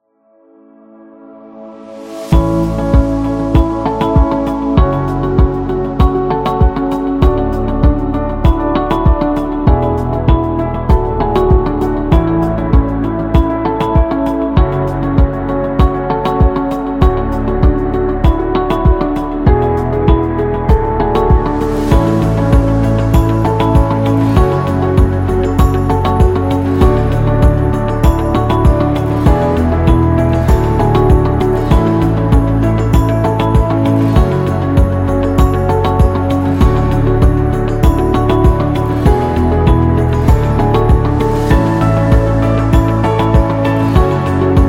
Ad Background

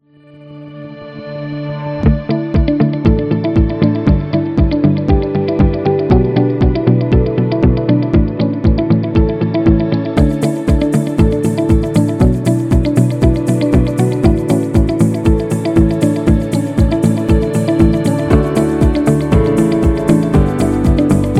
Educational Background; corporate music vol 13

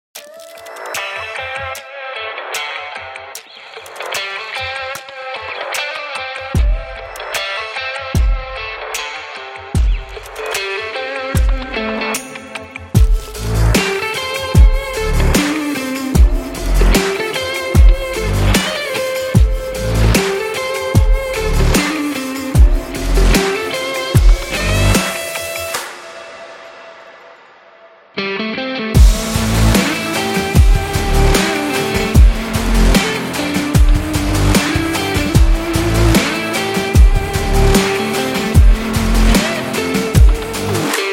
Sexy Rock

